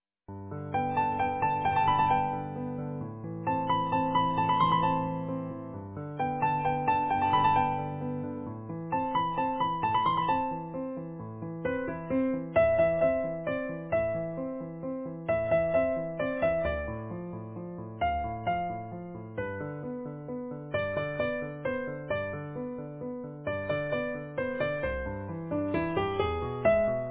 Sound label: easy piano